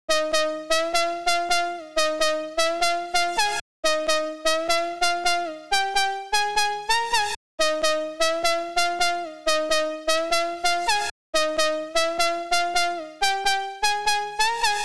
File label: 128 BPM F Min Lead; House; Drop Loops; Loop Stems; Cymatics; Sydney